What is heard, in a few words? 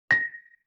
Xylophone Single Note A#; Vintage Cartoon; Musical Elements